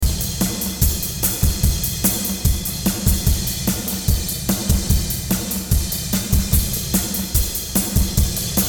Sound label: Piezo Drums; Thremino